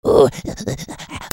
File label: CARTOON